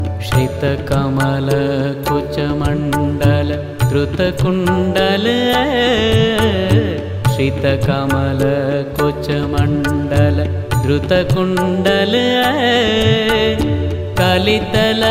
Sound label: Shankar Gayatri Jap, Bhakti